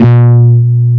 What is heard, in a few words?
ESQ 1 FAT, SYNBASS; Synthesizers; Soli-Music; World of Synthesizers VOL-1